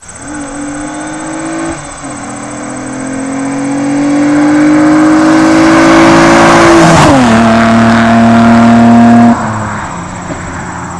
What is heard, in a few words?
flyby